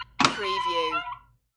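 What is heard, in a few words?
Door Click Creak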